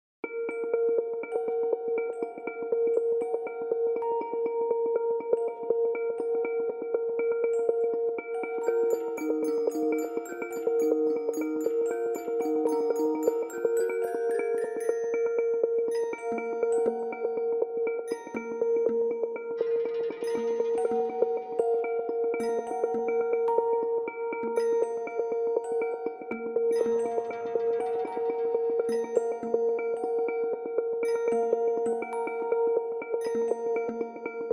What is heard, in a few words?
CRAZZY MACHINES